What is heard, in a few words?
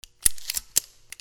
WEAPONS